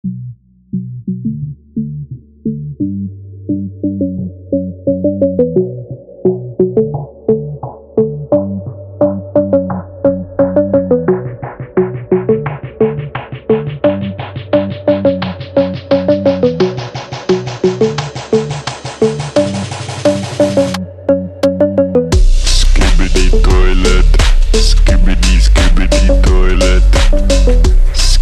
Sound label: skibidi toilet slowed reverb